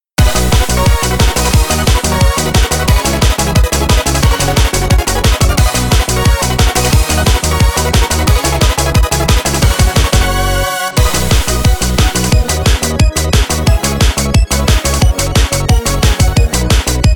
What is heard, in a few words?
a rainy day without an umbrella no vocals